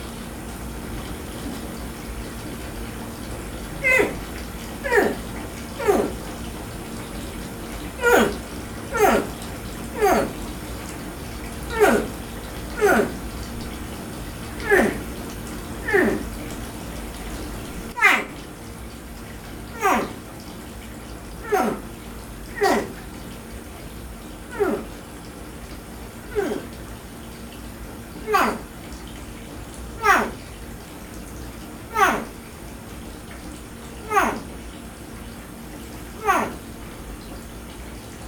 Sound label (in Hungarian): már a tojásban is így hallatják a hangukat, tompaorrú krokodil; pecsi zoo 2017